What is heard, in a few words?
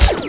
lazer